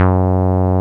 ACID F#2

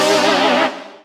Synths Root C

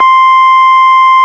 ORG, Farfisa Clarinet

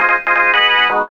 SYN RIF

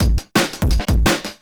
Low And Behold 170, Drum&Bass Breaks; EZ Rollers

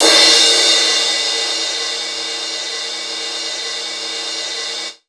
CYMB; Houseworx; Drums; Cymbal

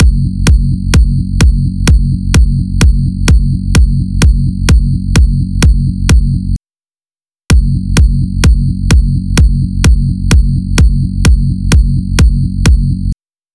Drum Loop 128BPM BD f#; Loop Elements; VEC5; Drumloops; Multi Loops

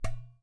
GHATAM, DRUMS OF IND; AKAI S6000 CD-ROM; WorldSounds

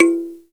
KALIMBA; Spectrasonics; Heart of Africa; KALIMBAS

